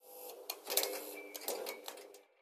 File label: ambient port; World Of Warships; port3d